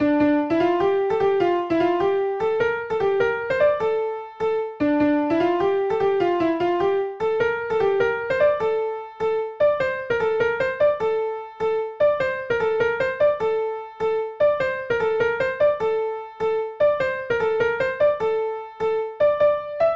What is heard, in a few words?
Soprano Part; choral; Black Alman; These are individual parts broken out for songs in the choral ball set